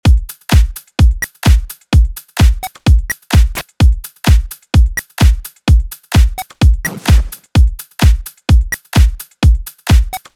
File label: drums